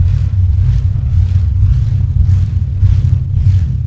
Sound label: loop, engine; zafir; sphinx